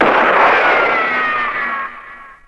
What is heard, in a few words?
gun shot; Crash Bang Boom